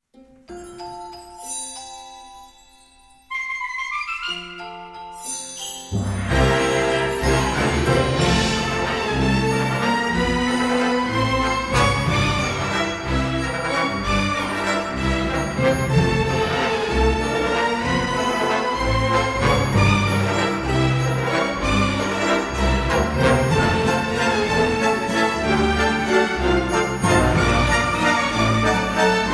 Flirtatious Waltz

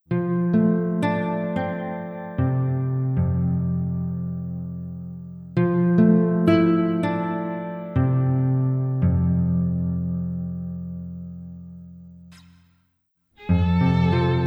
Fiddle Guitar Nostalgia

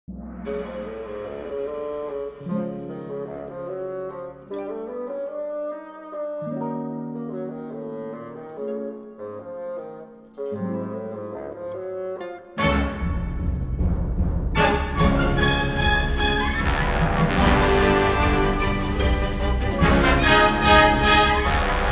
Bassoon Solo Adventure Theme

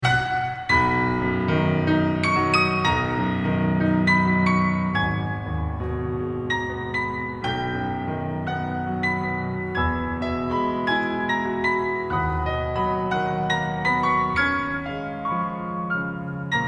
Chopinesque Piano

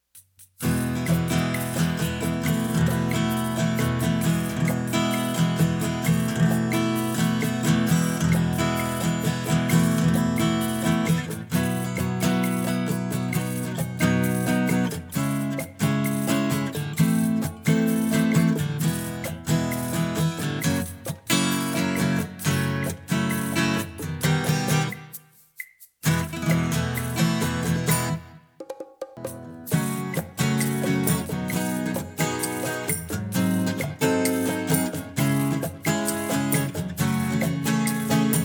Latin Folk Journey

